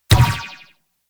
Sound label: Ball Bounce Dirt; Warframe; Tenno Ball; Missions